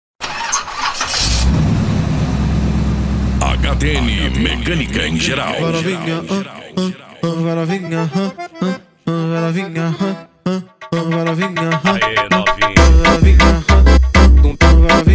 funk e lambadao

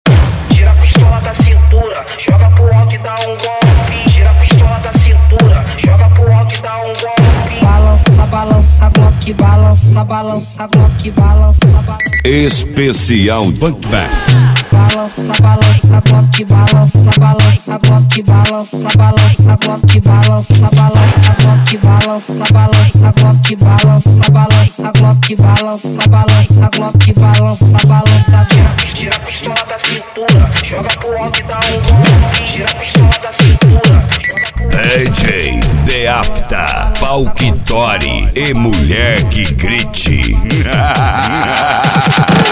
especial funk bass

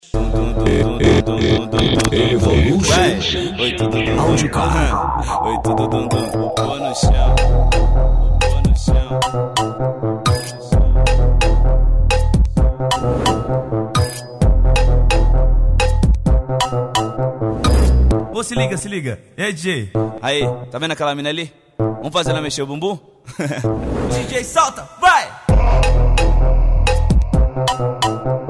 funk evolution audio car

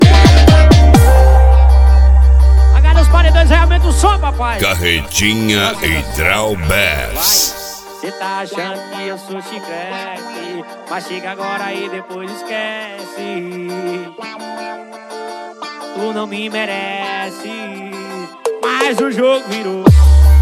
piseiro

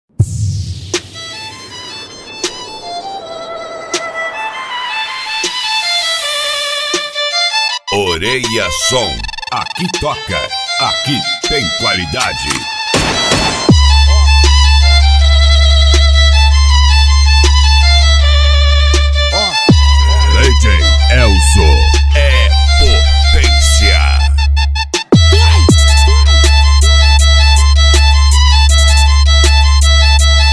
violino; oreia som racha de som

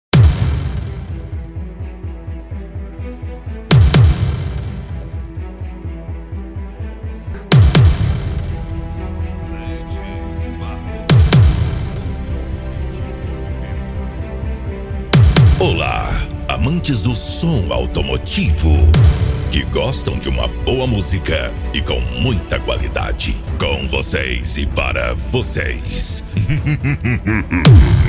esp funk mega bass

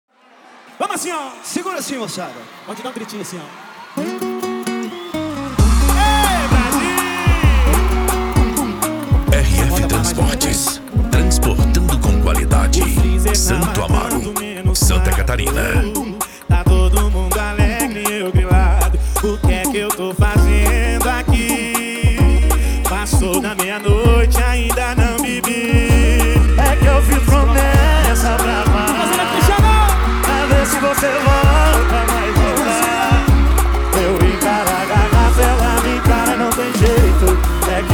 especial sertanejo

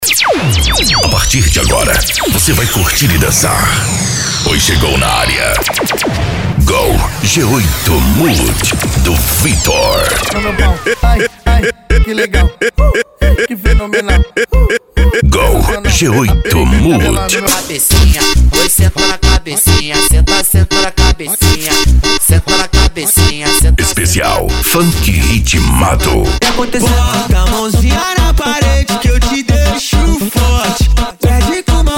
funk ritmado

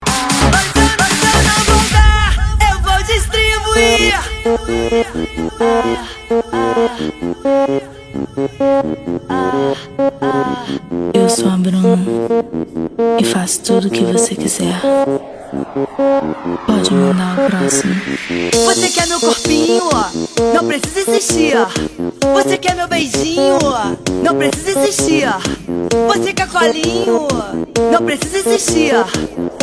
eletro funk

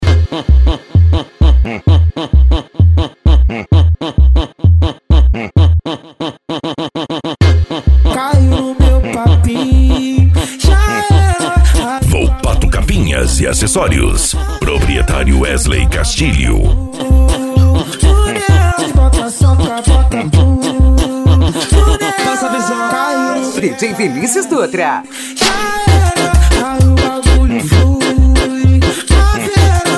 funk consciente e hip hop

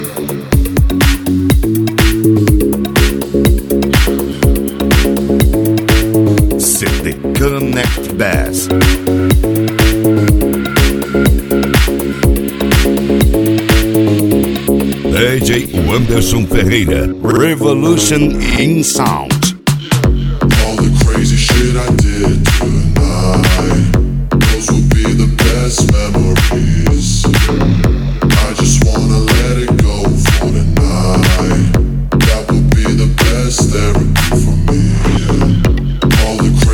bass house e rap

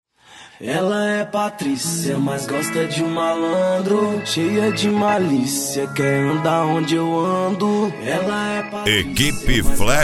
rap; cd rap flash sound

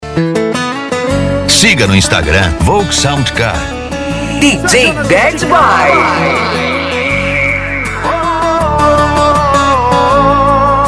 sertanejo e pisadinha; cd volks sound car

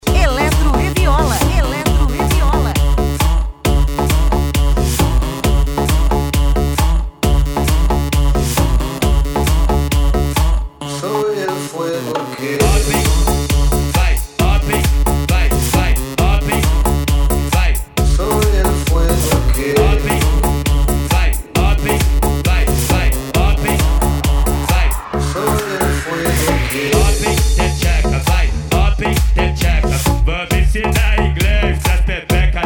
eletro e viola especial de eletro funk

